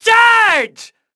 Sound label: Charge